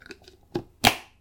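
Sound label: close instant coffee jar; cooking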